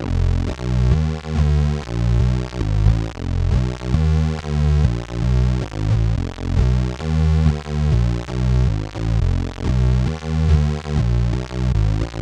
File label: Synthline Pultec